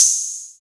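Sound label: CR-78 open hi hat; Hi Hats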